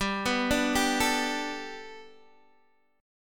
G Major Add 9th Guitar DADGAD, chord

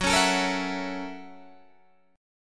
F sharp 7th Sharp 9th, Guitar, Open D

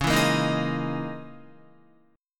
Db Minor 6th Add 9th Db down Guitar; Dbm6add9 strummed; JGuitar

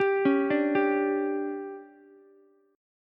D Major 7th Suspended 4th, Ukulele; DM7sus4; arpeggiated; JGuitar

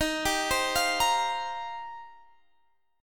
Eb 6th Add 9th Eb, Guitar Half Step Down; arpeggiated

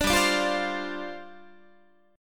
G 7th Suspended 4th G down Guitar

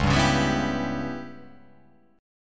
C sharp Minor 7th Flat 5th C sharp

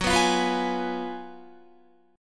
Gb Major 7th Suspended 2nd Suspended 4th, Guitar

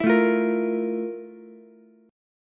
F Major 11th Ukulele C6; strummed; JGuitar

